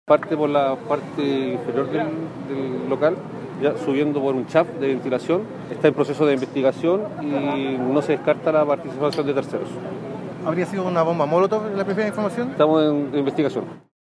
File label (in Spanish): incendio; disco pte alto